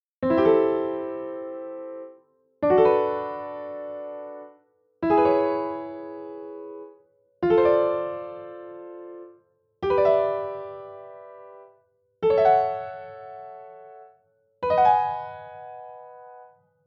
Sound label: chord name six sound